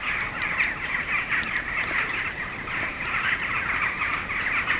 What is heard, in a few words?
Campfire Birds; outdoor; Camping